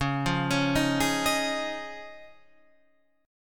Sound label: C sharp Major Add 9th C sharp; x,x,11,10,13,10,10,12